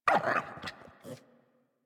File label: Puppy Idle Fall; Enemies; Vocals; Kubrow; Warframe